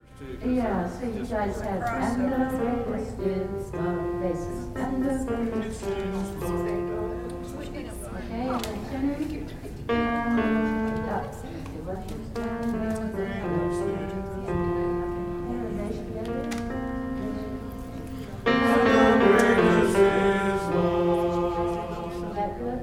last of refrain, bass; Gather Hymnal

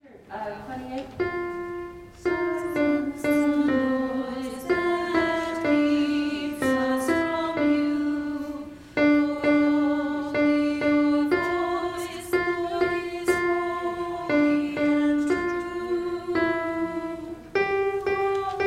alto

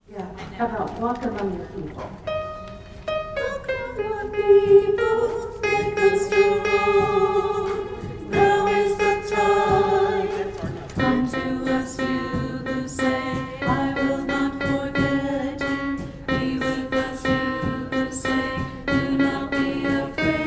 alto refrain

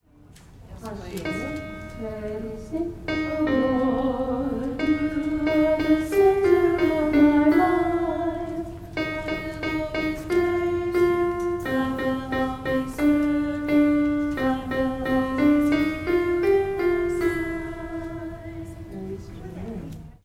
alto; Gather Hymnal

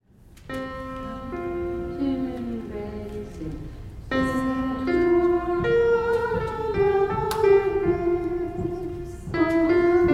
soprano; Gather Hymnal

